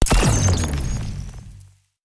funnel

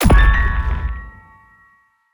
piano hit

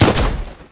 imp pistol

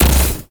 skill archet imp

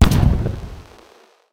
fire boom hit

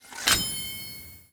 katana

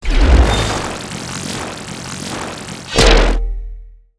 basson

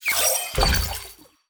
ui round success

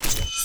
atk magic sword

